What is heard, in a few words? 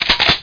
SHOTG